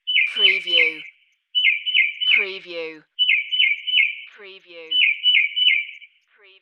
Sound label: Mocking Bird Call, bird